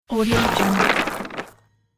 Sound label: game plant regrowth; sonic-boom